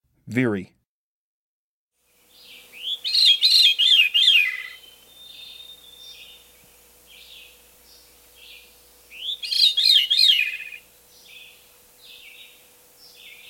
Veery, Birds of the Chicago Region

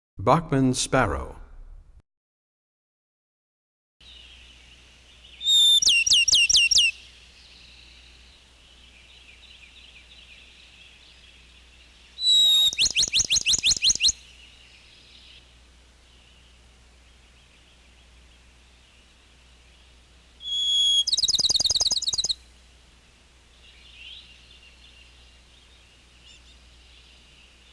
Bachman's Sparrow; Animals; Birds; 101BirdSongs

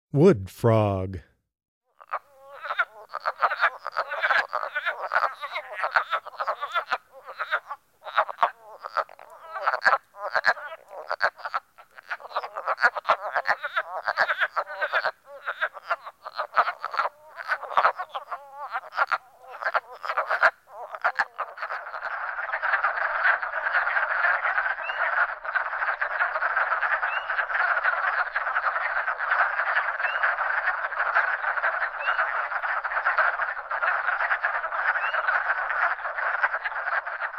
Wood Frog, Wildlife Sounds

